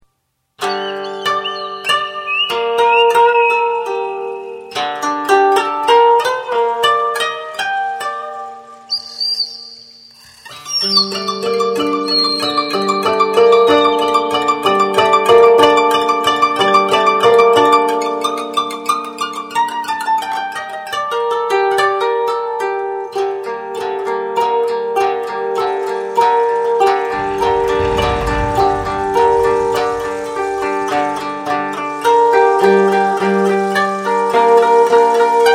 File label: koto